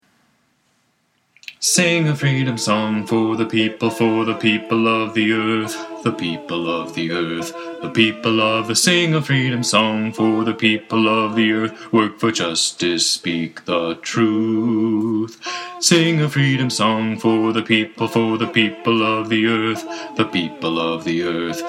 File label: Sing a Freedom Song Bass